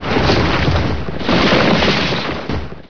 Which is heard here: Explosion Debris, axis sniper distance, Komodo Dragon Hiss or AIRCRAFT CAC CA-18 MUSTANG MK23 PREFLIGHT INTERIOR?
Explosion Debris